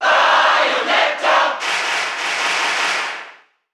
Cheer German; Bayonetta; SSBU

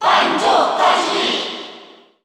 Cheer Korean; Banjo & Kazooie; SSBU

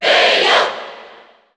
Cheer English; Bayonetta; SSBU

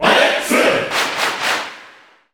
Cheer English & French; Alex; SSBU

